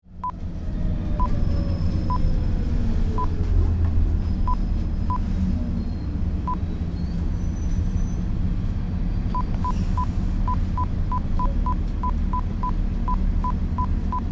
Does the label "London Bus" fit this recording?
Yes